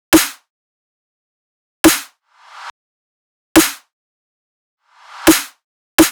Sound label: Riddim Drum Loop 140 Snare; Ghosthack; Riddim Essentials Volume 2; Full Drum Loops